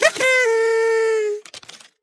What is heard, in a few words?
win trumpet; Hot Party Deluxe; Wazdan